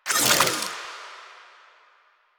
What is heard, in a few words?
Finisher Parazon Unequip; Kuva Lich; Warframe; Finishers